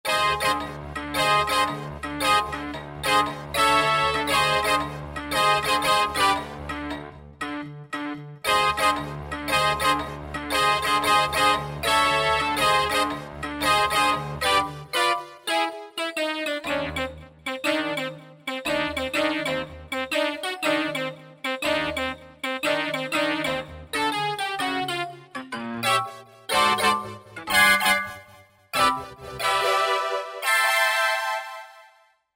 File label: Analog Punch, Korg Wavestation A-D